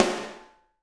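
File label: SD, snare drum, Real-Kit; 90 s Sample CDs; Sound & Vision - Gigapack I CD 1 (Roland)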